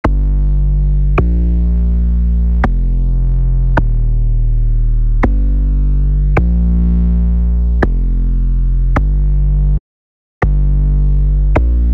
sub bass

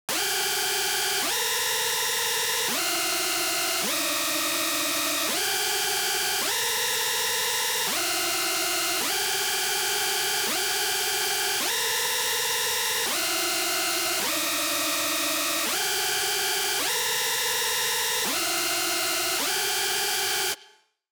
SCREAM LEAD

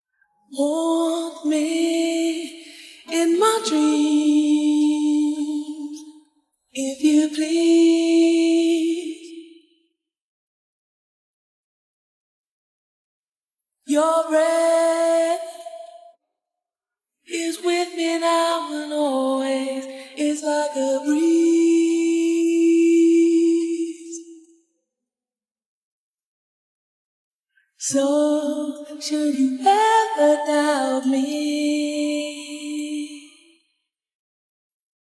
ACAPELLA

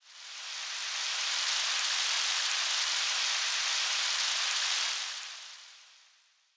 sfx

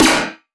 SNARE; HOLIDAY MOD STUFF; Plum

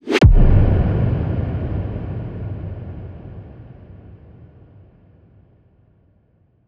Bass

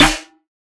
Future Bass Snare - D#; Cymatics - Odyssey

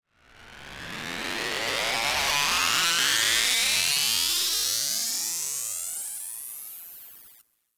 uplifter; cartoon network; HOLIDAY MOD STUFF; Plum

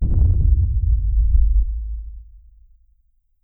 Bass Rumble

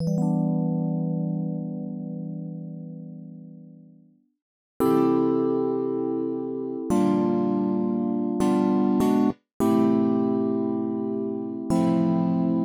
vibe and piano chords

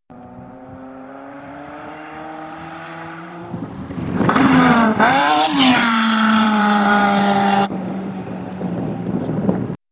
A car engine accelerates then hit the ground

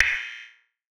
PERC; osu

